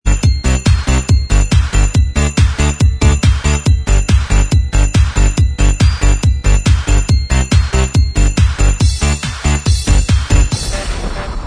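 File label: instrumental, backing tracks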